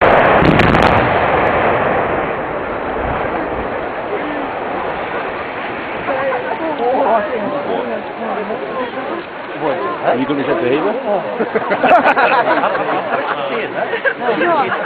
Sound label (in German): bruckensprengung